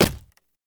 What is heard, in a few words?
bullet hits wood